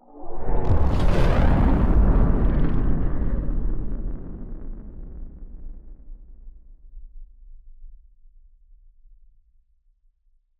ship battleship move; Stellaris; shroud; ships

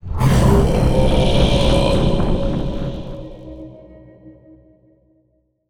lithoid levitating; lithoid portrait; Stellaris

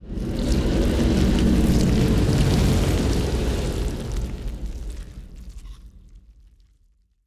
ant ship titan move; biogenesis

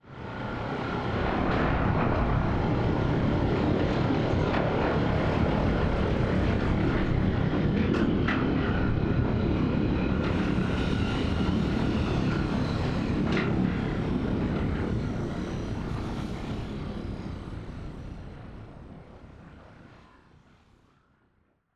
ship colossus closing; Stellaris; machineage

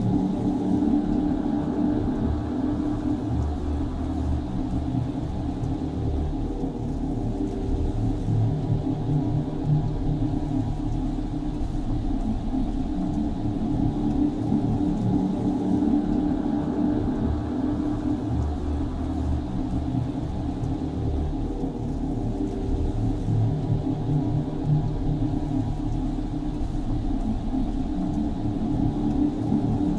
ship titan idle; Stellaris; machineage

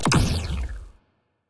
weapon debuff hit; Stellaris; biogenesis; weapons

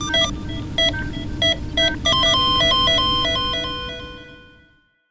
life support fail; Stellaris

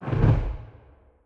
ex dimensional portal thunder; Stellaris; other entities

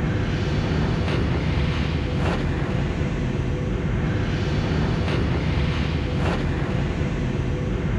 toxic muzzle; weapons; Stellaris; toxoids

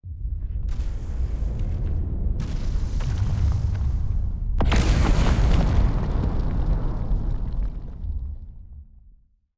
plasma volcanoes; Stellaris; extreme frontiers

